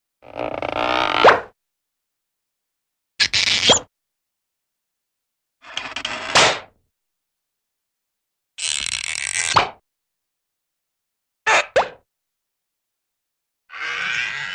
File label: Various Squeak & Pop Creaks; Hollywood Edge Cartoon Trax